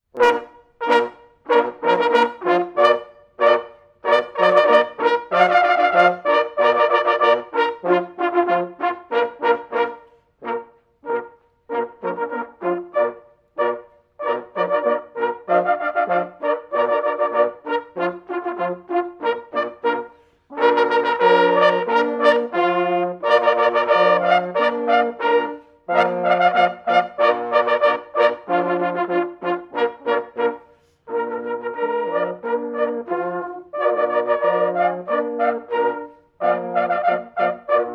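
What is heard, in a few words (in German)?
Walkauzpolka, jagdhornblaeser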